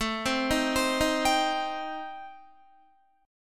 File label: A-Minor 7th Flat 5th, Guitar, chord; Am7b5; arpeggiated